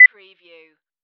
pro beep

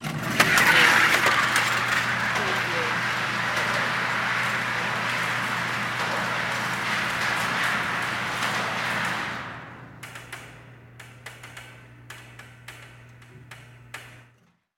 Shooting Range Target; sound effects bible

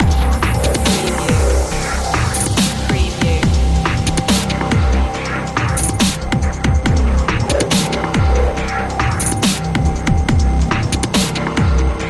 steampunk robot loop

